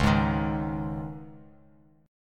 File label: C sharp Suspended 2nd, Bass Standard; strummed; x,4,6,8